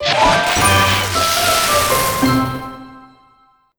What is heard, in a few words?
Crunchy Finish